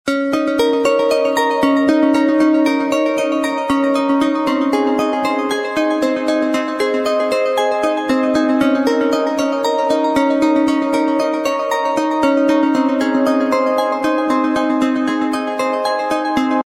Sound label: cool melodic synthesizer ringtone